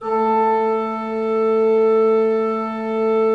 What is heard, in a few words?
A3, Cathedral Organ; Propeller Island